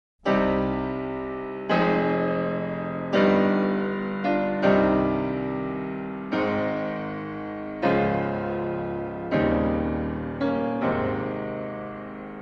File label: prelude in c minor piano; chopin